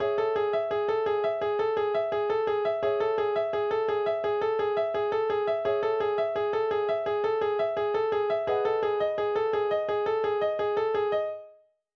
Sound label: instrumental